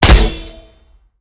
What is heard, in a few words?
hit; Smite; god Lancelot